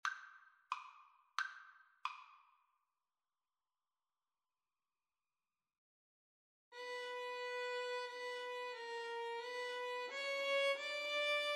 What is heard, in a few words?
pavane violin